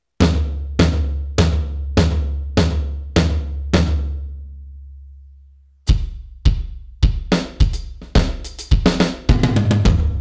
ALESIS Debut Kit Klangsynthese

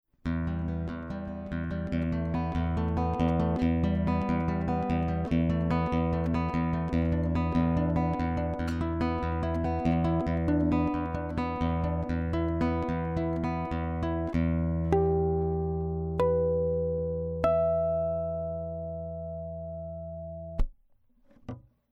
Ak Guit Nylon DI Signal EQ nach Wunsch

